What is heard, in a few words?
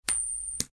coinflip play